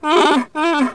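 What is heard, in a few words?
Wookiee female dead; Star Wars Knights Of The Old Republic I; XBOX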